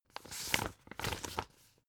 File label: old examine